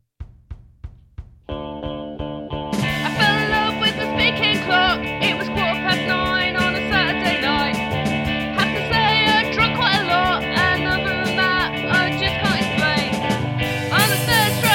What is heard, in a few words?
Speaking Clock